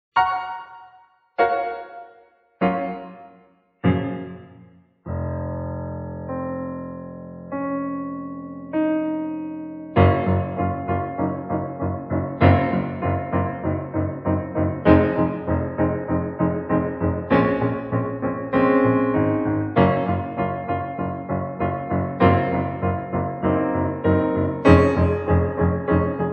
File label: Reverb